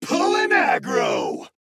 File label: Weapon; Knight; Paladins